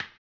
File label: HAMBONE, Vocal Blues Soul; Spectrasonics; Planet